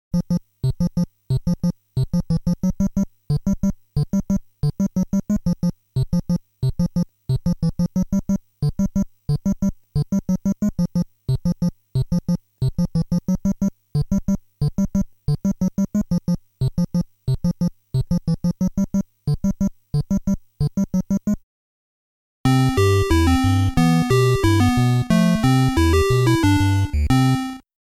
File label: Stage 4 BGM Stage 4 Clear (All Clear!)